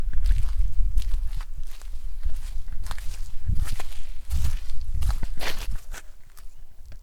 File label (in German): Gehen Waldboden